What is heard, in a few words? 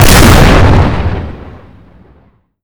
explosion detpack main; Explosions